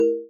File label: dong r